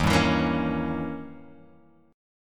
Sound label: Eb 7th Flat 9th, Eb, Guitar, Open F; 3,2,4,5,4,x; Eb7b9 strummed; JGuitar